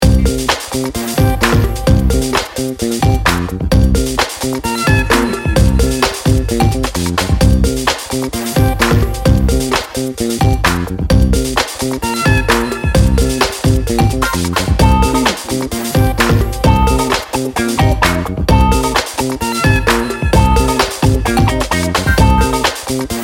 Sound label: good time funk